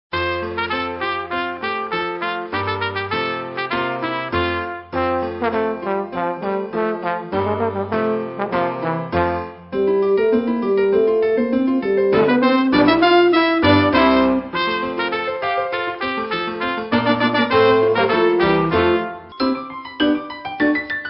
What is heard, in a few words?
traditional christmas